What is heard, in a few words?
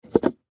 Gift Box Set Down; Set Downs; Objects; Blastwave; Foley